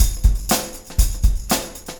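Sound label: beat, Room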